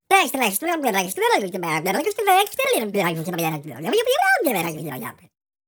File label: babble